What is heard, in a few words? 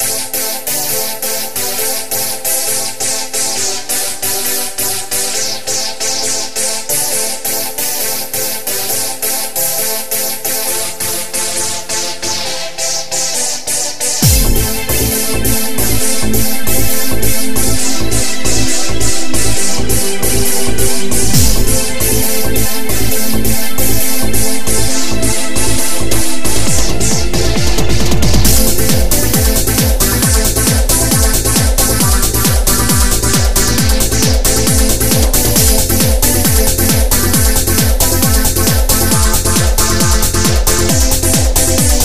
Dance